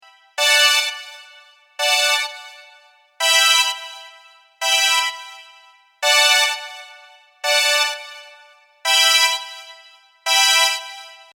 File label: Bpm85 Bm Brass Synth